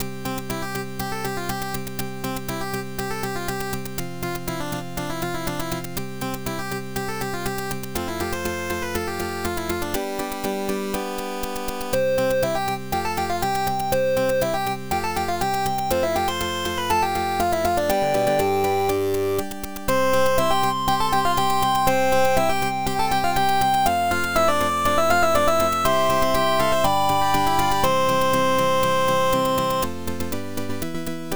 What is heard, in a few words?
happy 8bit loop; tristan lohengrin